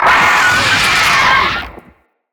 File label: Spider Vocal Death; Vocals; Root Spider Mother